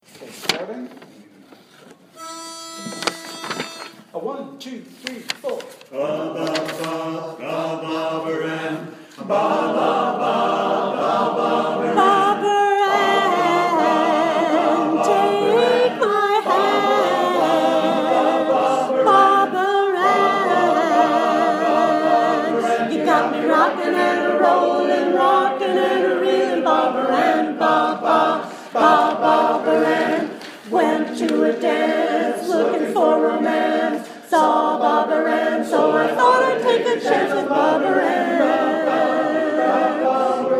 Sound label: Tenor